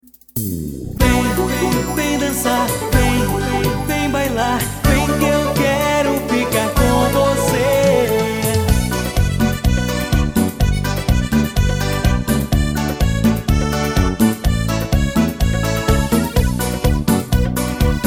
cumbia brasileira, teclados

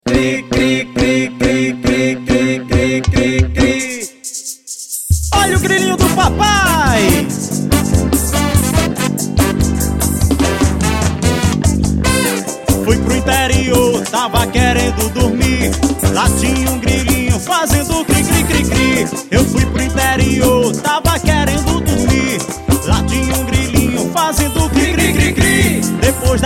swing

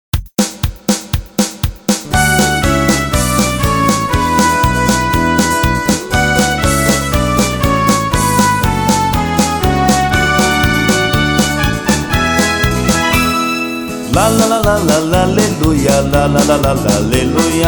aleluia

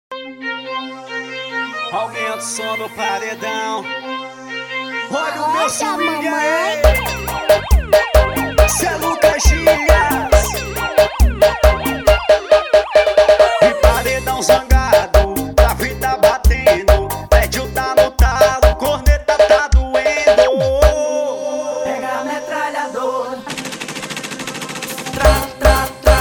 swing pressao

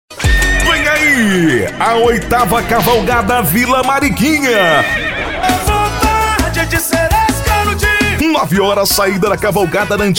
cavalgada

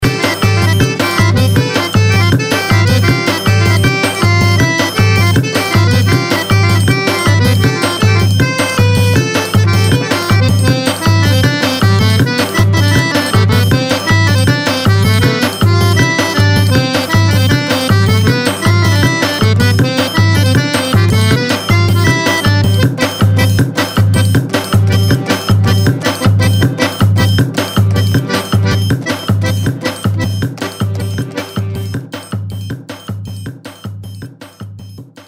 instrumental, forrozaia

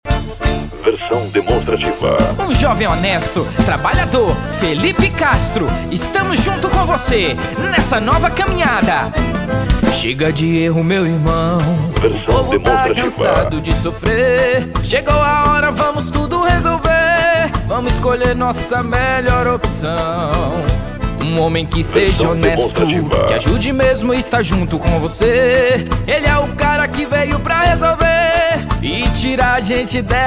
jingle; pre campanha 2024; vereador prefeito eleicao campanha politica

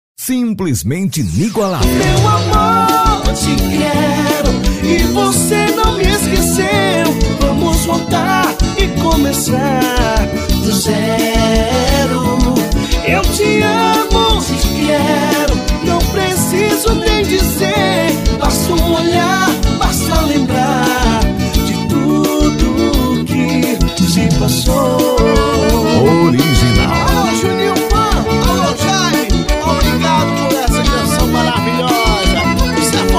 forró; vinhetas

